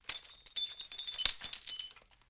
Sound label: Glass Shards Fall Su